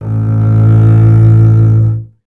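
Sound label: STR CBS, Solo Instruments, double bass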